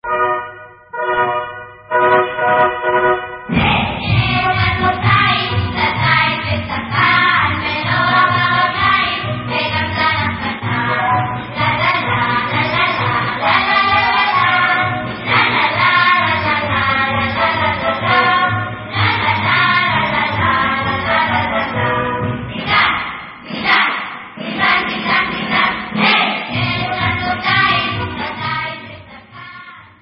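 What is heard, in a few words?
Folk Children, Israeli Dances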